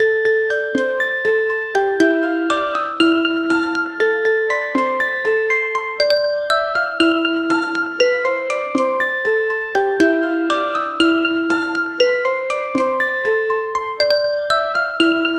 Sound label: quirky whimsical fantasy